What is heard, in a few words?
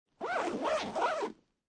Zipper Zipping Close CD Wallet, Foley, Zipper, Zipping Close Large CD Wallet; Zippers